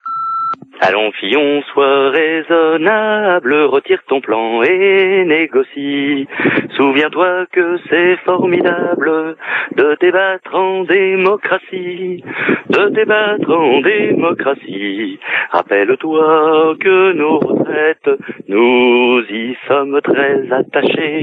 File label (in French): Manif retraites chanson repondeur